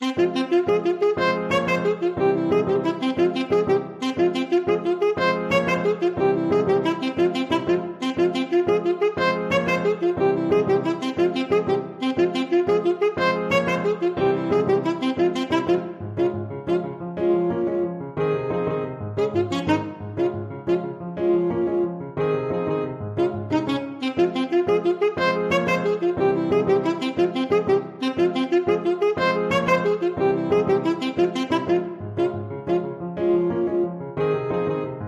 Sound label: wilder reiter, saxophone